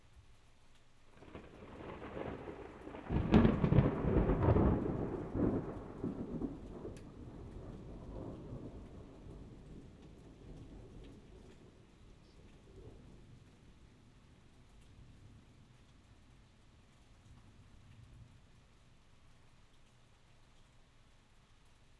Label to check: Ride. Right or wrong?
Wrong